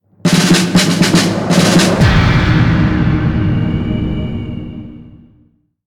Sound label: Music Success; SniperUndead; gamerip; Zombie Army Trilogy; PS4